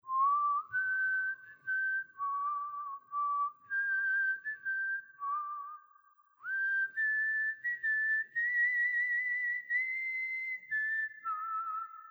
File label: whistling